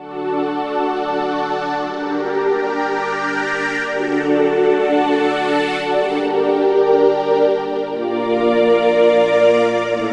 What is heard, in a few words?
Cinematic Pad; Pads; Dr. Fink's Funk Factory